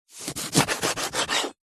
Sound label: food lemon slice cut